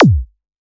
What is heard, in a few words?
Kick; LiLA'c Records PsyTrance Sounds 01